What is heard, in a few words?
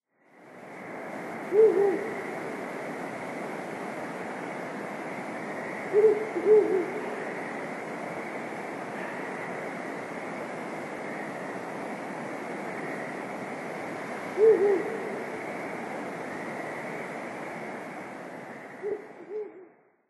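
Ural Owl, nasushiobara; koe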